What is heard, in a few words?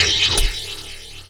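HH, hi-hat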